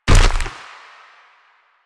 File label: Step Default; Enemies; Warframe; Proto Infested; Runner